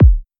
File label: Simple Electronic Kick